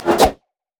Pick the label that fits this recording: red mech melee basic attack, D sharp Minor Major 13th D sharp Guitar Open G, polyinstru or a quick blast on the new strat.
red mech melee basic attack